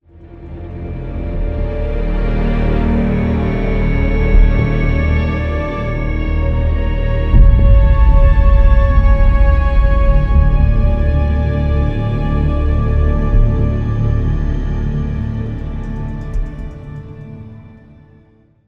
suspense

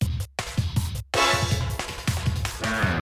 Combat START, soundtracks; bolt 2008 pc gamerip

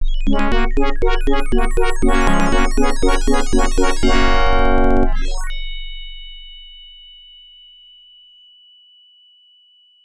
Fanfare; Major Stryker; IBM PC AT; Apogee

